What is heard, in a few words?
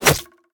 roman ranged bow player hit; smite; npc; roman archer